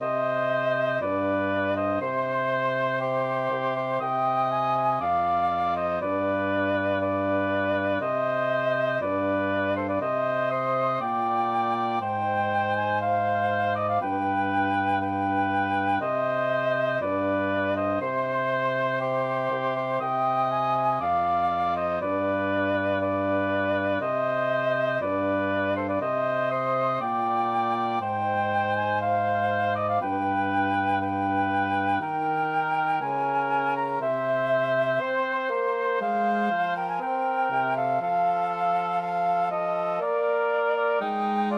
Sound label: for woodwind trio; the queen's farewell